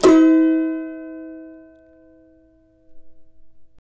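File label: C# major, Guitars; Spectrasonics; Hans Zimmer Guitars VOL-2; CAVA CHORDS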